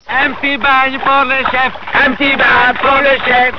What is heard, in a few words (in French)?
un petit bain; de yo comics